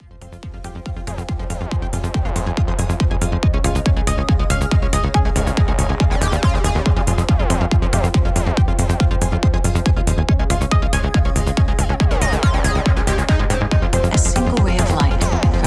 Psytrance Attack05 Release 10 GRL 4 Warm on 2. Haelfte